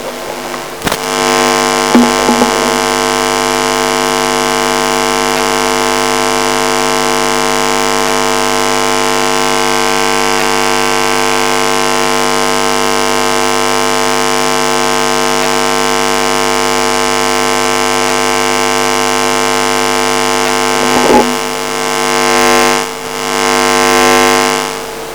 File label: modulating a magnetic field through speak thinking